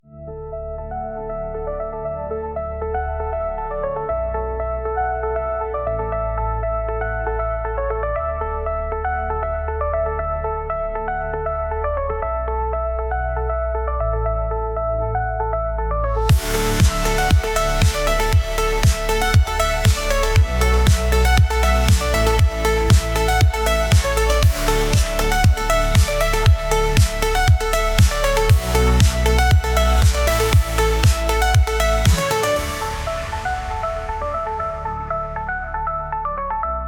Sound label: Retro Synth, synthwave